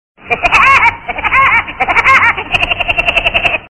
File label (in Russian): Смех; Вуди и его друзья